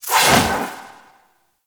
Doom Sword Whoosh D; Excalibur

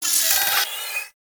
Turret Harness Movement One Off

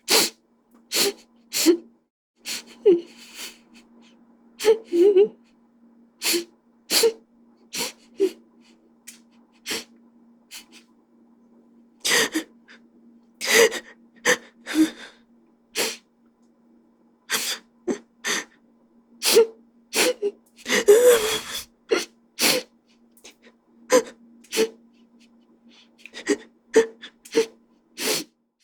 Student Female, Whimper; Warframe; New War; Zariman

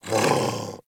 Kubrow Puppy Sleep Idle Snore; Warframe

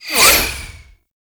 quick strike projectile pass by; Roathe; Warframe; Tau; Abilities

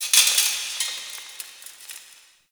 Metal Debris; Warframe; The New War; Zariman

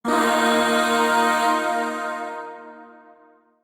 Shawzin Blues Chord; Warframe; Zariman; Void Shawzin; Chords

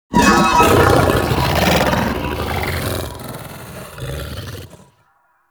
Death Scream; Enemies; Vocals; Warframe; TheNewWar; Archon; Amar

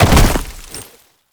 Land Heavy Ice, movement; Warframe; Uriel

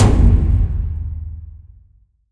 Interface Single Drum Hit; Warframe; Cues

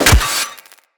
Melee Staff Impact Robotic Flesh

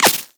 Laser Impact Flesh; Warframe

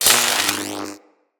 Lightning Rifle Spark; Weapons; Corpus; Chain Lightning Rifle

